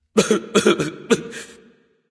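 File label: nonverbal boohoo; smite; voicepack; ullr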